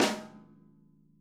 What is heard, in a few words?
SNARE, Interactive Drum Kits; Sonic Reality; ID SNARE 3+